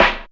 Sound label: snare, Rave Kick Snare, Percussion; Dance Synths